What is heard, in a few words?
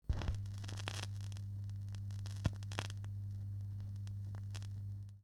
Vinyl Needle drop